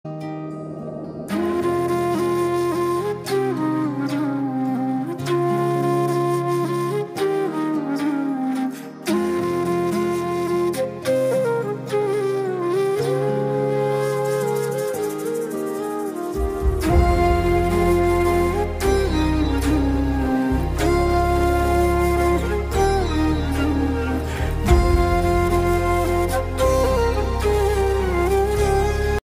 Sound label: naa saami ranga movie bgm; nagarjuna akkineni; allari naresh